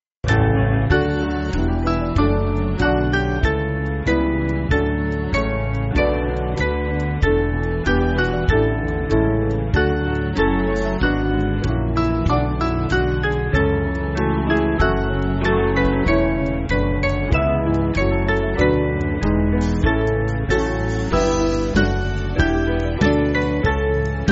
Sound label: Band, Backing Files for Churches; Irvine Waterside